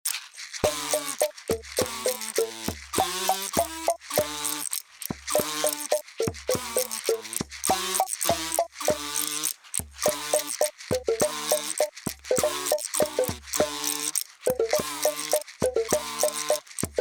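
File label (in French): Orchestre, instruments de musique recyclage; Dans la ferme à Maturin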